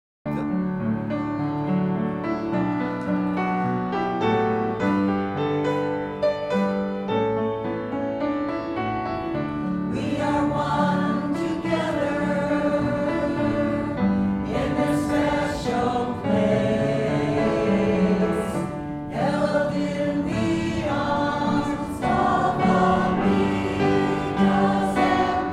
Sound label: song Choir; world convention 2027